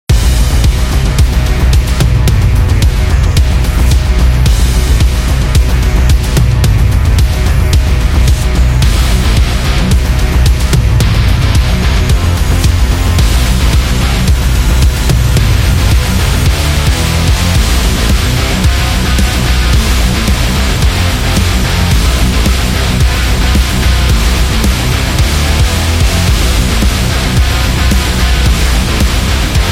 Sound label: Aggressive